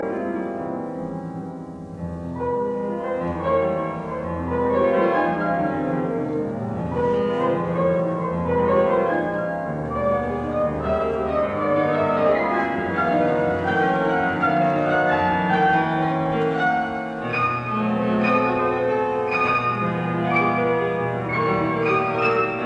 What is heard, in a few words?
Etudes in F minor Appassionata; 12 Etudes d'execution transcendante; Claudio Arrau